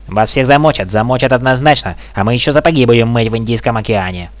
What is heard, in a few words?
startle, hostage reactions